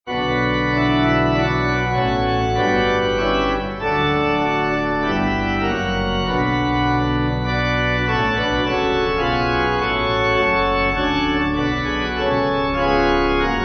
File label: Pipe; 6.6.6.6.8.8